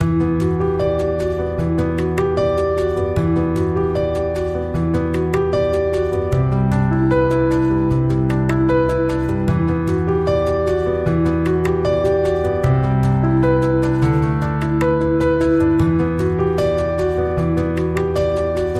Piano

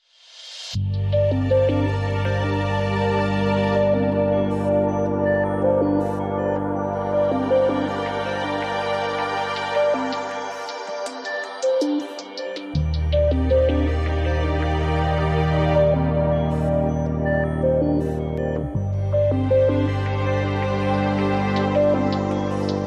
Chill No Drums

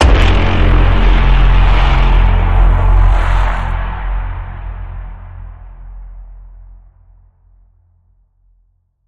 Bend, F

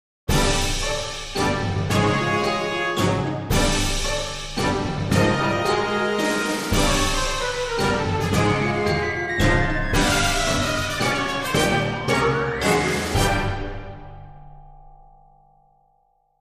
Drunken Elephants March

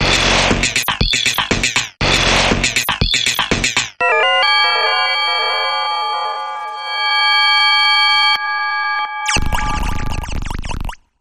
Random Tune Sci Fi Bumper Reversed

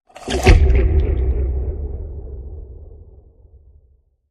Water Sewer Hit; Red Dawn

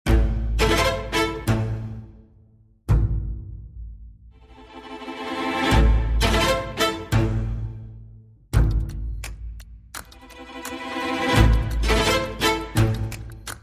Light Percussion

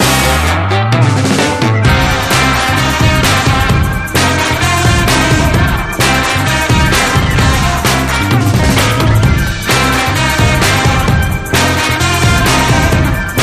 Carnaby Street Twist

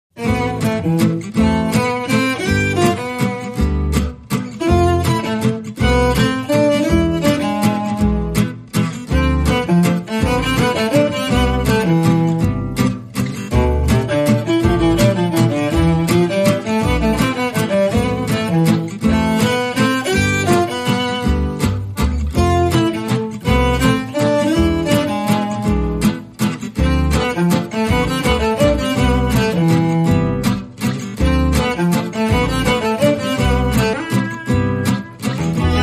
Bal Musette Waltz, No Drums

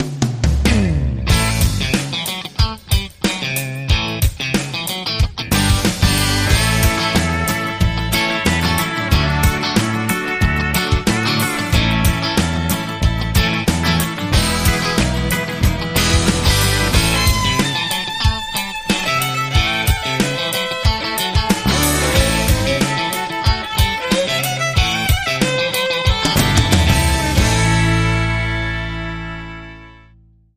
Roadhouse Stomp

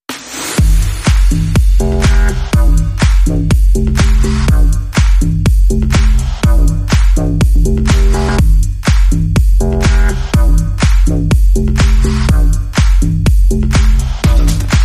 Bass and Beat

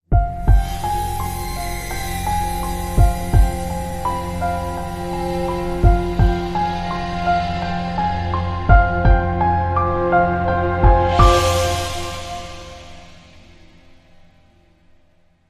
Crime Sting

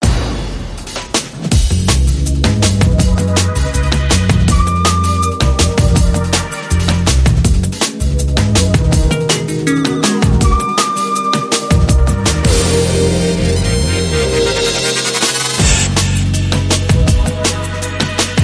Nu Drum Old Bass, Short

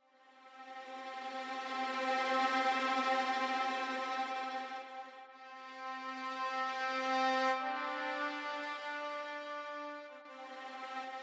Tremolo Real Strings

